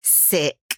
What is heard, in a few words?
Picking up; Devour